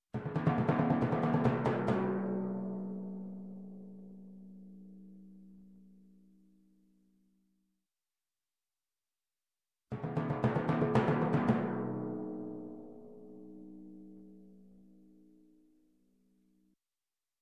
Timpani tag, Music, Percussion, Drums; Sound Ideas 2000 Series

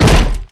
door kick; DeadFright

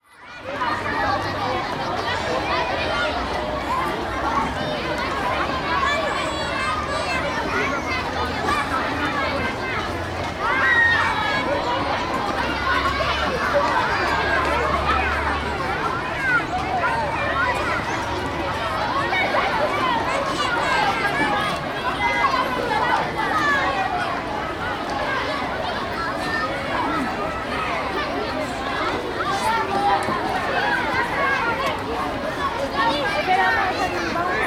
Playground Active Children Outdoor; Sound Ideas; Walla Series

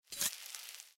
Sword; Blind Quest - The Frost Demon

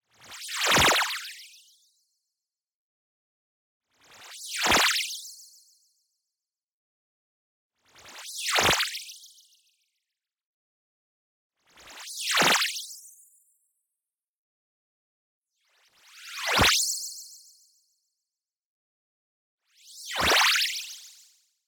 movement fast, whoosh modulation; boom library; Anime Essentials; DSGNMisc

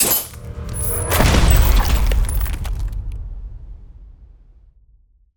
Ice Spell Explosion Impact Arctic Annihilation; Ice Explosions & Impacts; Arctic Aura

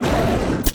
Fast Attack; Kyllius; Blind Quest - The Frost Demon

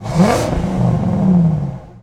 Truck Ford250 Rev; Trucks

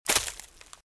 Footsteps Leaves Walk Right; RadwinIsland

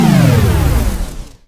Radio Hit Descent; Transitional Elements; 1001 Sound Effects

